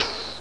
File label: deflection sound; MacAttack 1.9